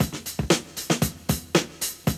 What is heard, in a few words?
break; ZERO-G; Datafile